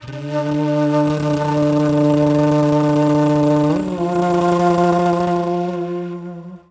flute, ethno; eJay

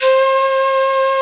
panpipe, Melody; AlgoMusic; Amiga 8-bit Sampled Voice

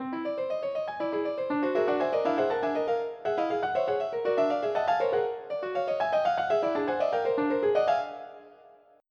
midi

